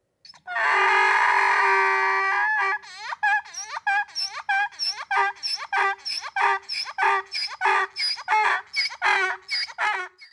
Esel

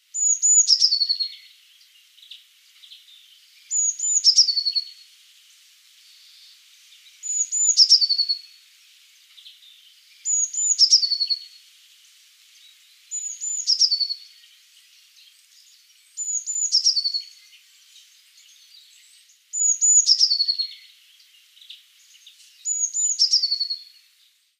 blaumeise

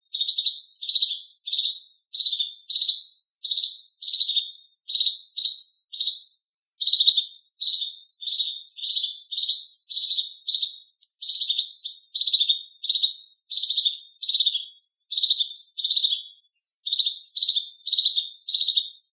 Haubenmeise

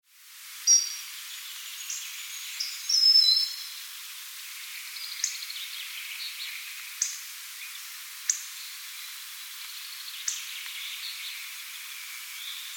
kernbeisser

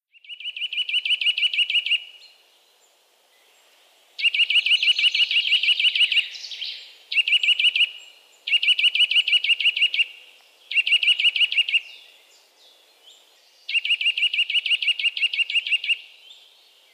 kleiber

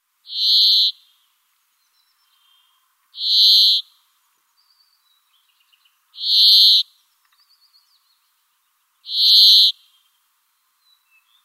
Bergfink

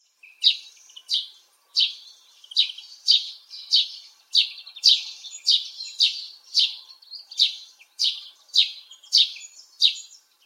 Feldsperling

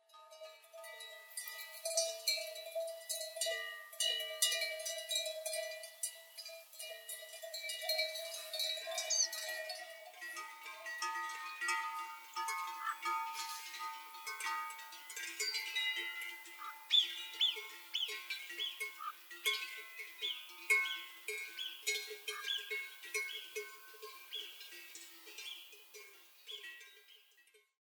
Auf der Alm

